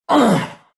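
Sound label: pain, Russian; dota2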